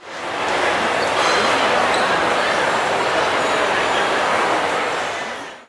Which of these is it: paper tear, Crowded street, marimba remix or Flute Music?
Crowded street